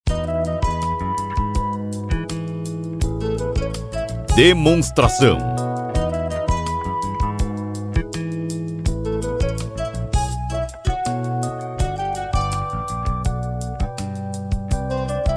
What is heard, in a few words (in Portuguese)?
Trilha